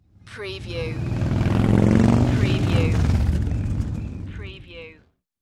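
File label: Motorcycle Drive By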